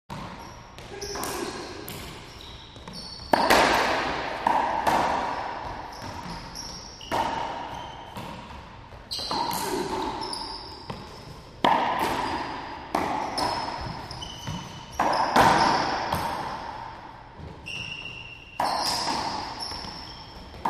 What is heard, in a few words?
Racquetball; Racquet Ball Game Ambiance